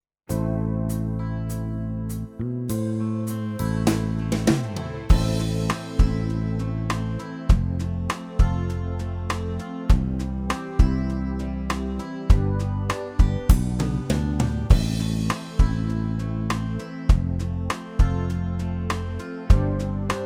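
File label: Ketron SD 90 Style Ballad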